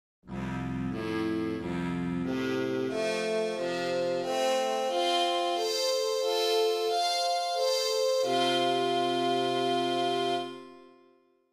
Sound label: muted horns